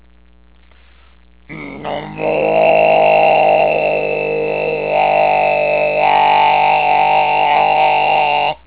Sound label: throat